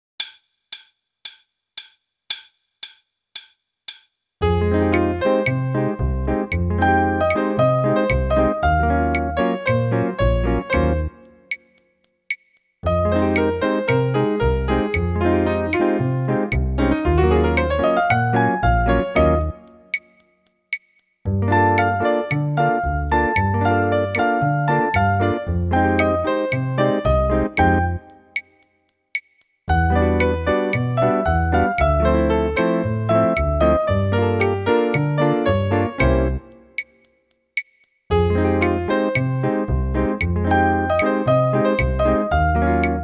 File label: Beguine